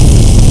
flamer

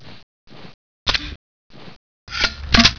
m79 reload

